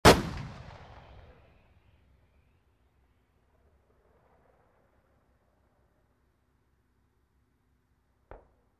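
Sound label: tank shot; Стрельба из танковой пушки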